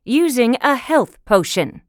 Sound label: Using Healing Potion; Realm Royale; Hunter; Twilight Huntress